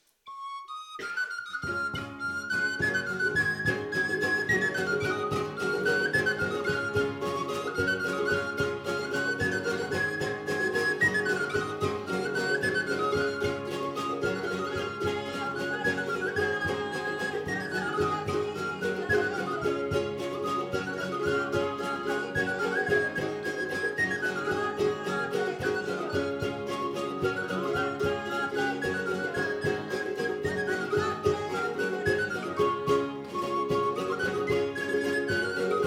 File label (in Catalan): Mateixa amb flabiol